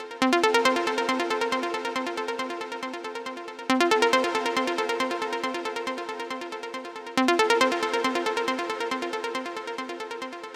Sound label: Another Day Kit 138BPM Pluck WET, F major; Melody & Synth; Vengeance Samples